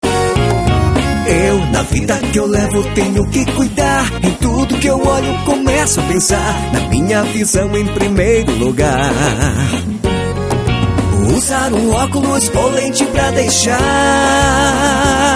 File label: jingle otica